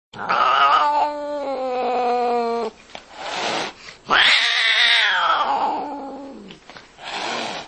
angry cat

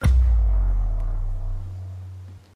error; pasapalabra rosco

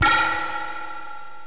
scifi

